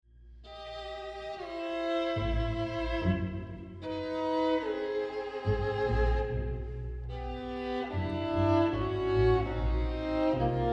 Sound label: Viola Concerto